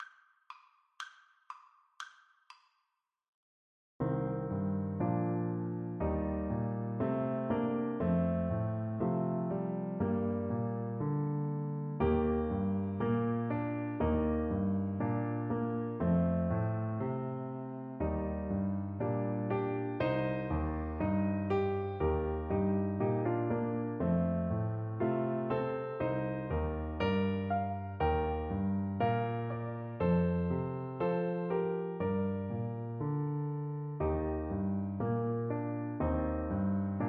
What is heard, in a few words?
wondrous, Trombone